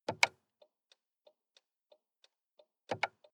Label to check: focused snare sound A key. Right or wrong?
Wrong